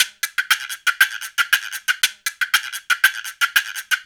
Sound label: African Contemporary, Mozambique; Spectrasonics; Supreme Beats